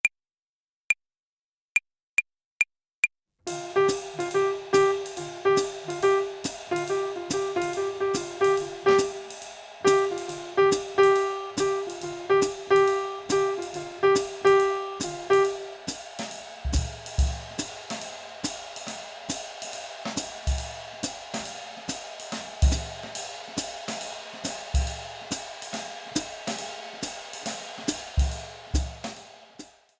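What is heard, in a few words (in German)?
Swing Phrasierung Übung; play along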